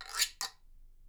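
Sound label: guiro, Percussion; Miroslav Vitous - Percussion & Harp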